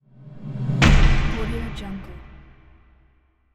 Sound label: woosh impact